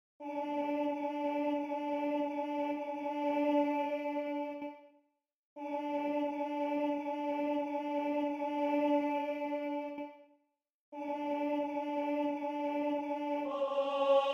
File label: alto